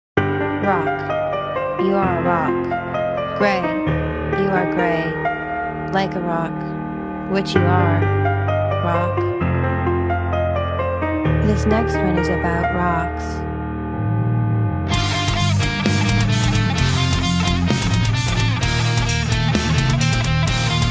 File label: Guitar Improv